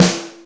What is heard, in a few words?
Snare, Yamaha RY-30 SNARES